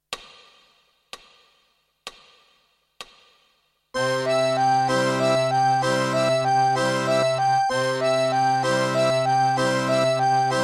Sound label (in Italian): Terzine tango